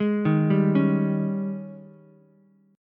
Ab Major 7th Suspended 4th Ab down Ukulele; AbM7sus4; arpeggiated

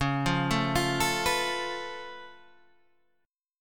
Db Augmented 7th Db down Guitar Standard; arpeggiated; JGuitar

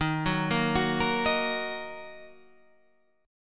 D sharp Minor, chord

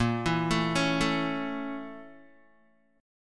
Bb Minor Major 7th Flat 5th Bb down Guitar; BbmM7b5; arpeggiated; JGuitar

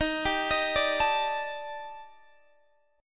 Eb Major Sharp 11th, down, Guitar, DADGAD

